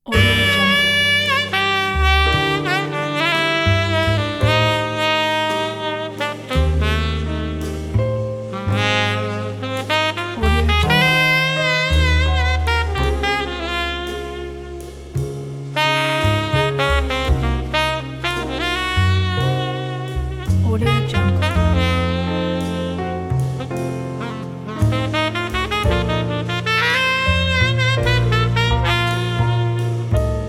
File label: sensual tenor saxophone